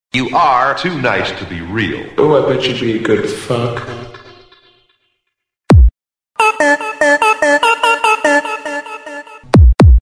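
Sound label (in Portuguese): alto falantes loudspeakers 5250 w agressive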